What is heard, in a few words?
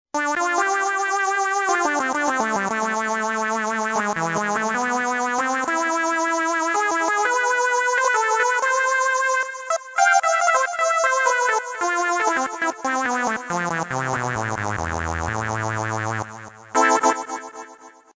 trem moog